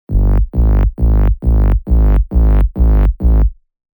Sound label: Offbeat Bender, techno bass; Spire; revealed spire techno bass vol 1